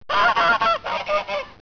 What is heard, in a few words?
GOOSE; psion cd vol1